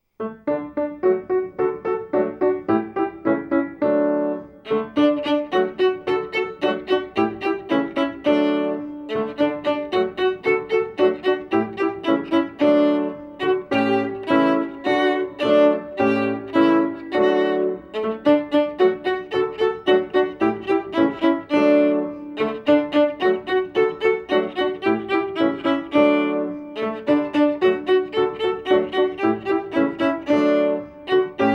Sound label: fine musician violin